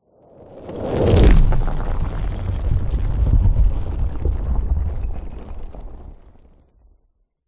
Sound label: sound design whoosh followed by rocks landslide